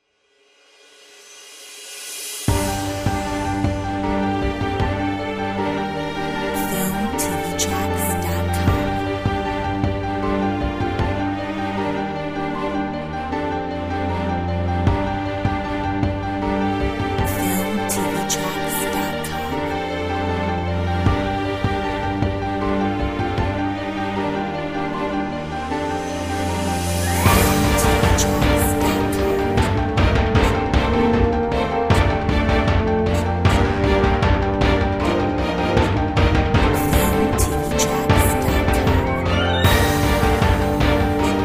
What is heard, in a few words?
trailer